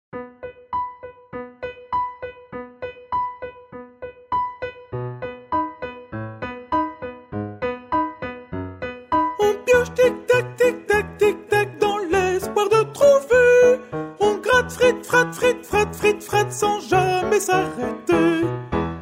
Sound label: SOPRANO